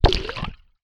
nature water splash small underwater object throw in; zapsplat